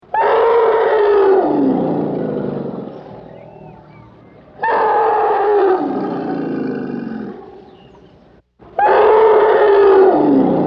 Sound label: Elephant Trumpet 4 Times; Animals; Elephants